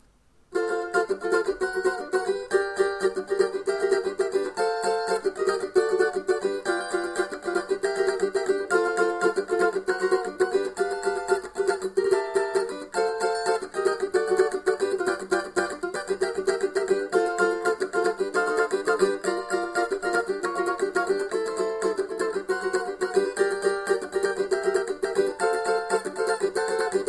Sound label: cavaco